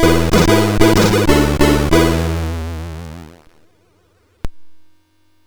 sid MOS6581R4

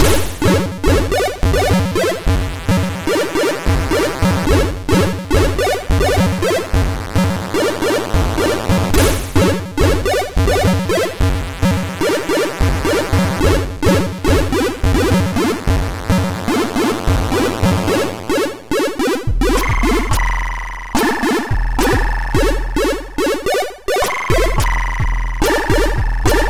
sid MOS6581R3

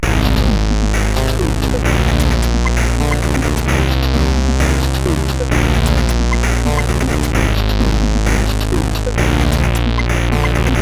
sid, CSG8580R5; Bozuma

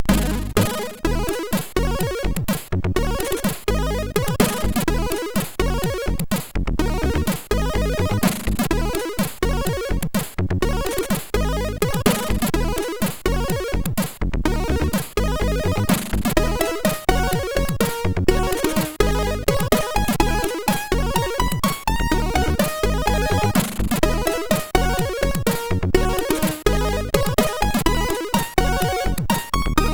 MOS6581R4, sid; Wrinkler

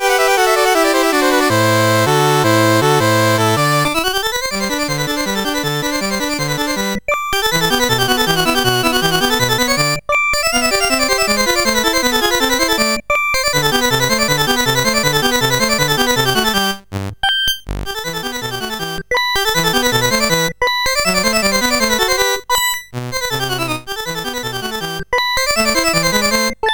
Typewriter; CSG8580R5

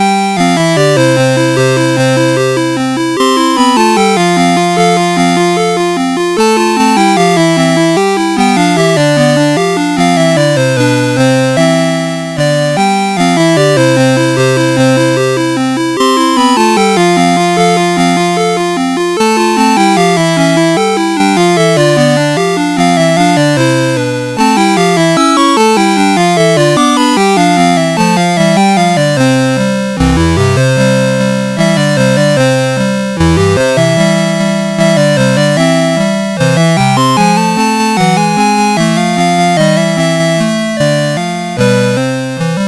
RONDO, CSG8580R5

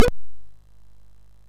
sid, MOS6581R2; Donkey Kong 1983

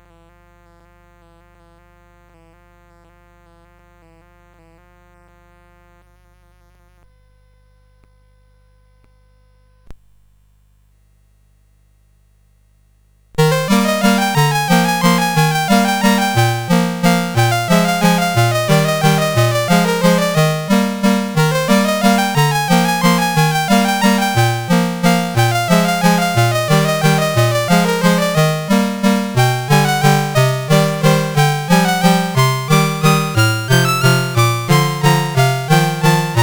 Minute Waltz, CSG8580R5

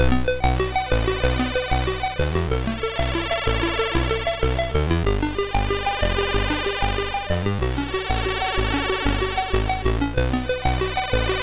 sid MOS6581R2

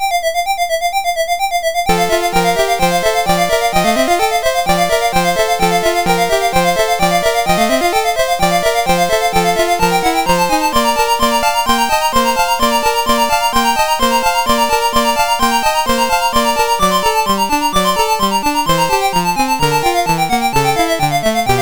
MOS6581; MOS6581R2

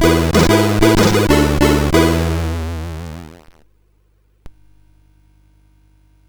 sid CSG8580R5

